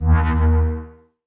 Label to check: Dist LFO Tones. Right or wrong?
Right